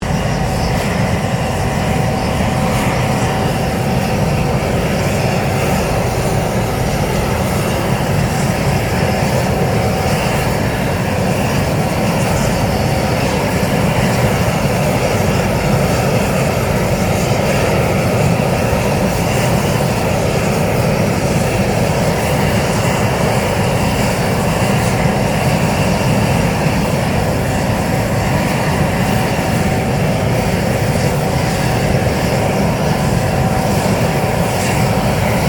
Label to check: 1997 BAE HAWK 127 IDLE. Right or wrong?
Right